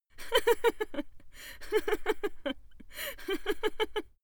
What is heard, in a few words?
female laugh